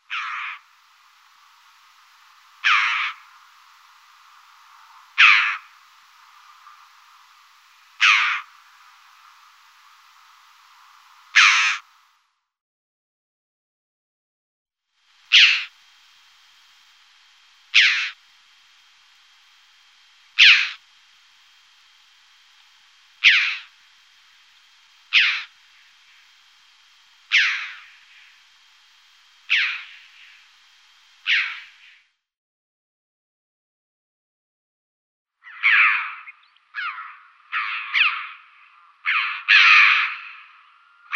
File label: Red-billed Chough